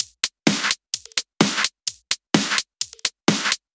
Drumloops 128BPM

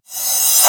Reverse Crash; Vengeance Samples; VEH2 Cymbals

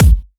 Hard Kick; Vengeance Samples

